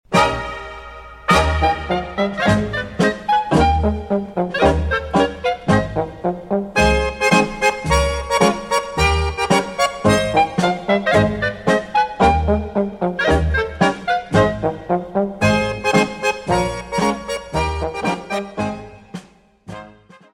Clap Danse - Allemagne

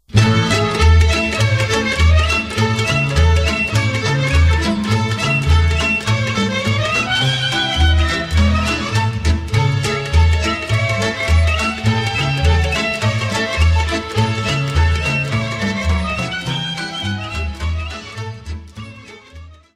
Calusul Oltenec - Roumanie

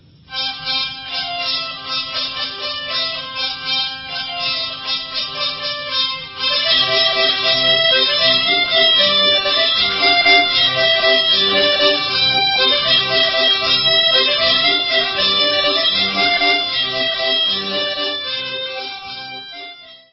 Troupiau, france auvergne

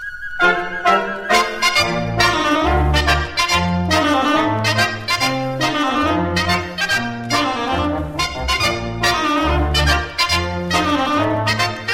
Espagne, Catalogne; Tirotitaina

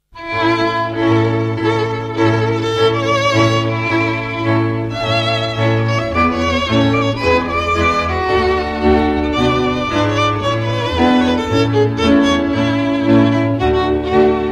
CARDAS - Slovaquie; PRESLAPOVANY CARDAS

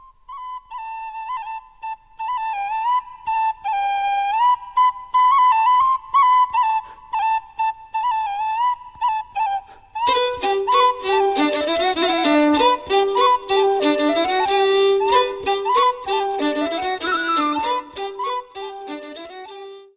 Branle - Polkla - France

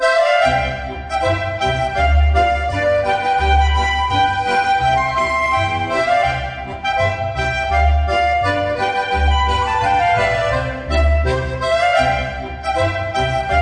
Polka - Autriche; Zepperl-Polka

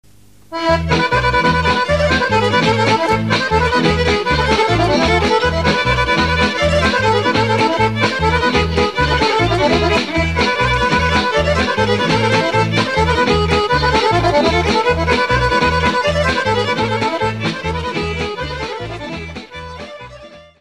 Kopanica - Bulgarie

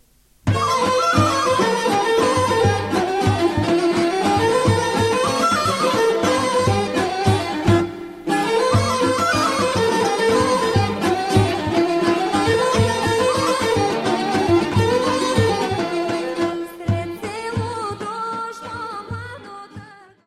Racenica - Bulgarie

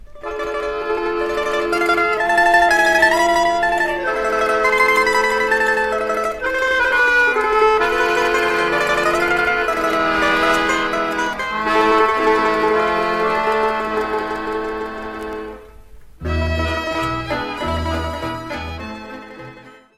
Korobushka, Russie

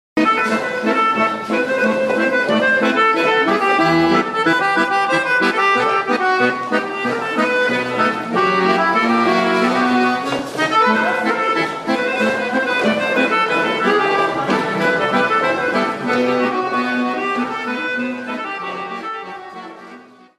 Kolo - Bulgarie

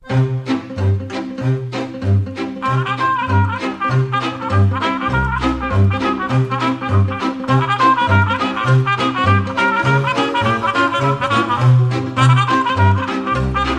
Hora Lautareasca - Roumanie; Dolj

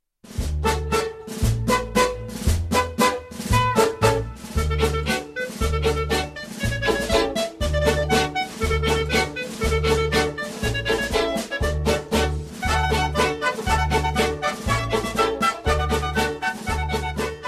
Polka Sextur - Danemark

